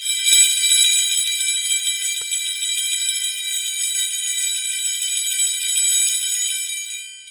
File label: JAP COWBEL, World Percussion